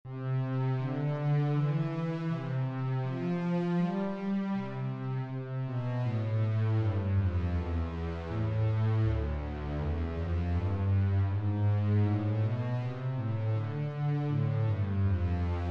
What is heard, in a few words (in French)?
VOIX